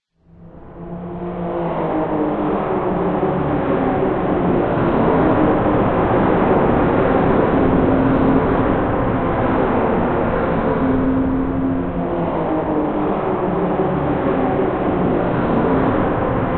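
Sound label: city ambience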